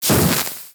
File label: Axe Projectile Impact; Warframe; Weapons; Tenno; Sundial